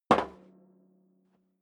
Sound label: step sneaker metal rattle single right